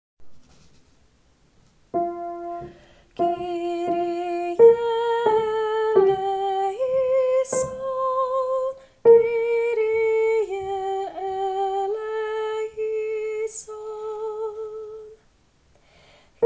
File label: tenor; Kyrie; spevokol